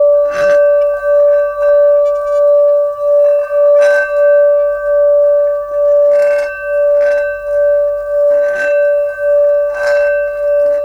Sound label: Singing Bowl; Sonic Foundry (Sony Creative Software); Continental Drift Aftershocks